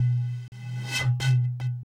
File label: bottle, ethno, 125